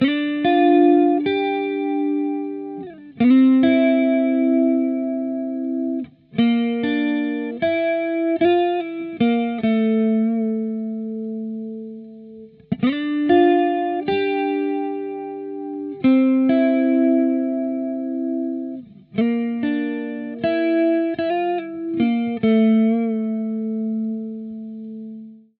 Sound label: Guitar Rhythm 75bpm D Lofi Chillout